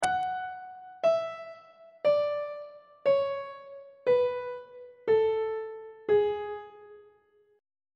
g sharp locrian mode descending on treble clef

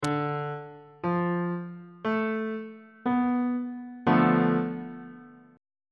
c double sharp minor 6th chord

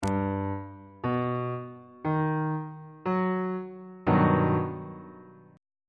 g augmented major 7th chord on bass clef

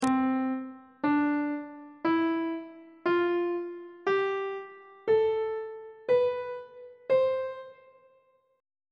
d double flat major scale on treble clef

